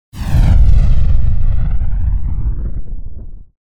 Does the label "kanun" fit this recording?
No